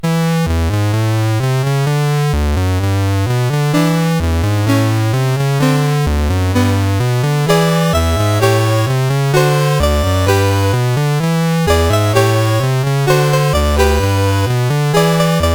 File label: CSG8580; CSG8580R5